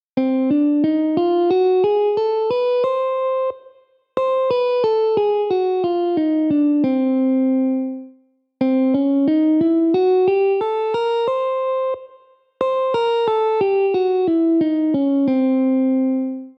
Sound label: Diminished; scales